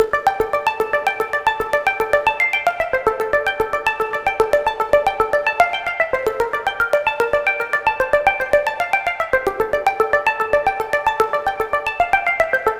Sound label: Arp 150 A; shimmer; Doubtfall